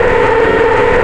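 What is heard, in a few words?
run skid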